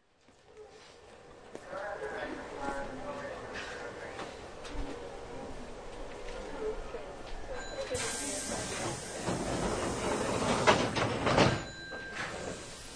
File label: hankyu 3300, train motor sound